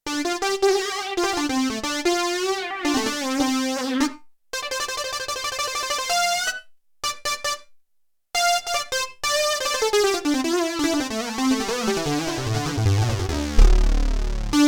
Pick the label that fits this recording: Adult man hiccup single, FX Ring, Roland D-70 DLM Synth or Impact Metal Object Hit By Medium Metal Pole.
Roland D-70 DLM Synth